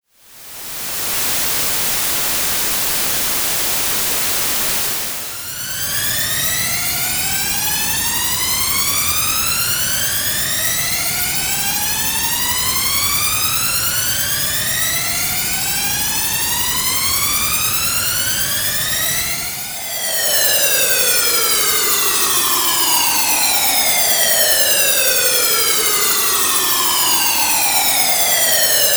White Noise; Eternal

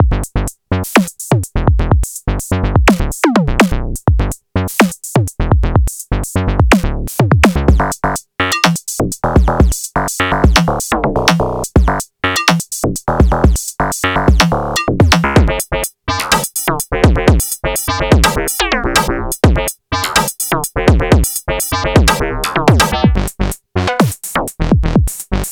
One Pattern - Four Kits (NTS-1); KORG Groove

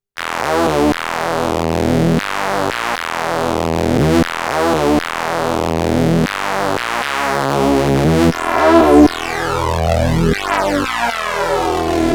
Analog Sweep; KORG Dipole

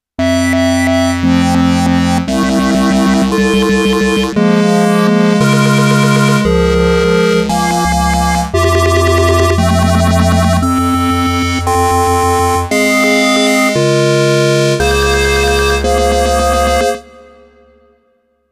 KORG Odds Stochastic FM

